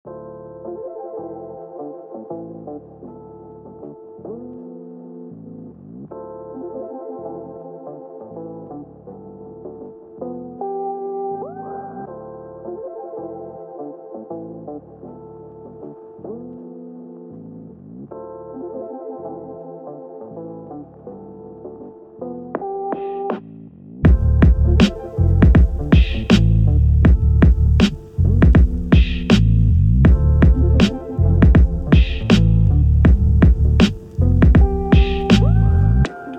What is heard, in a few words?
rains